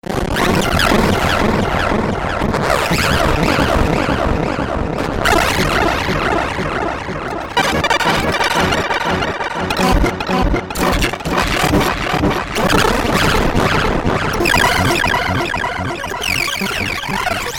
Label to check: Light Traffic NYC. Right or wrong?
Wrong